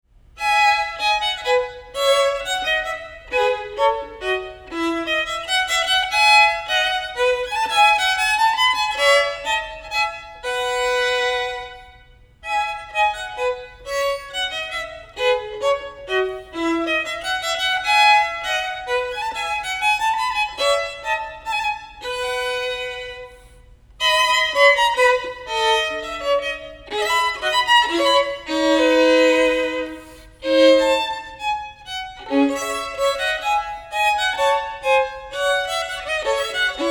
menuet

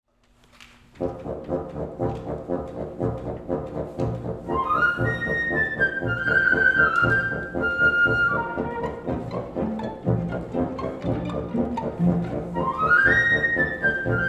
danse chinoise

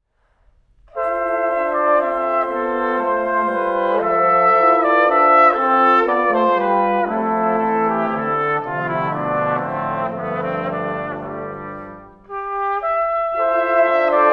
quintet for brass andante

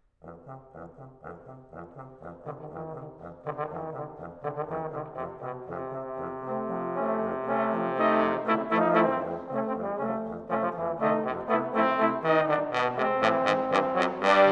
trombone quartet march

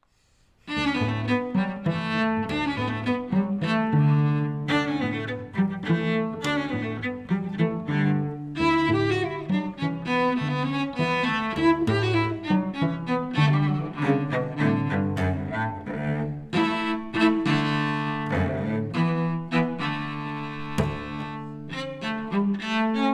cello

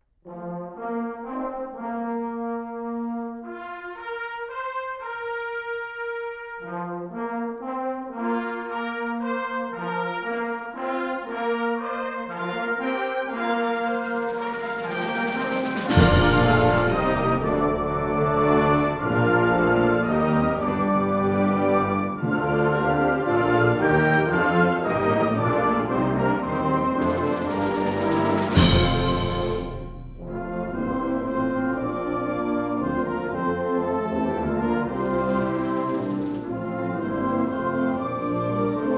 flourish for wind band

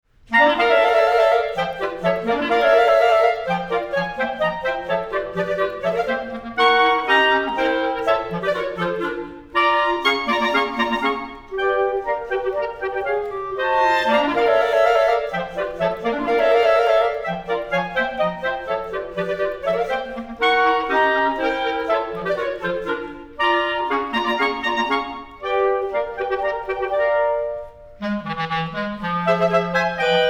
quartet scherzetto

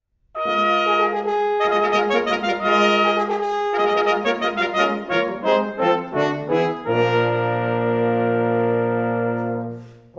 fanfare; la peri

